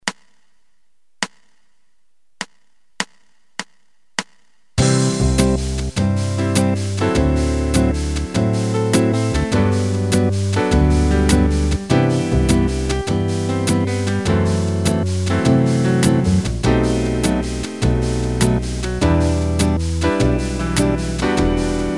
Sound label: Jazz; Harm - Em; MyVirtualBand